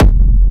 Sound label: Industrial Techno Kick oneshot F, Nicely shaped, crispy and reverbed industrial; kick drum